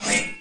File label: ax swing; insurrectionist